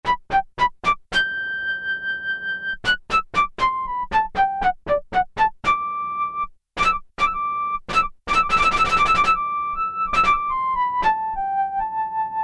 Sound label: Flute with Chiff, Korg Poly-800